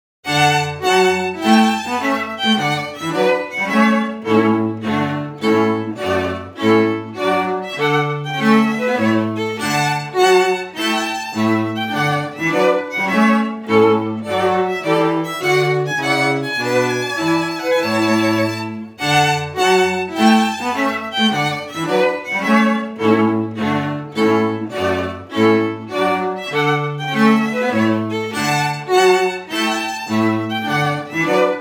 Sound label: Trumpet Tune